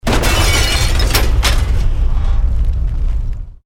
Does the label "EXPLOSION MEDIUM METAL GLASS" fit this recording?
Yes